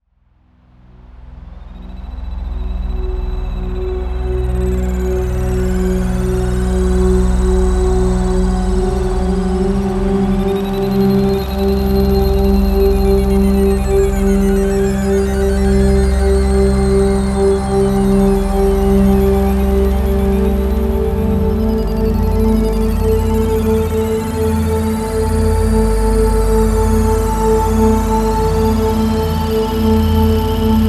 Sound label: Mantra Yoga Music Chakra Healing Music Meditation